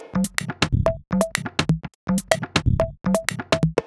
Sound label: Top G# 124bpm, House Drumloops; Zero-G House Fabrik Drumloops